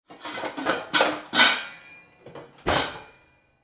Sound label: kitchen; fnaf; fnaf1